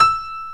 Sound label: GRAND PIANO; OMI - Universe of Sounds